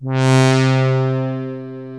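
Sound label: analog trumpet, synth pad; InVision Interactive; Lightware VOL-1; Instruments & Percussions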